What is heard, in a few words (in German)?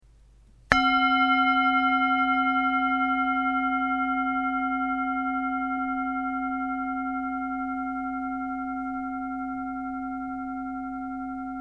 Klangschale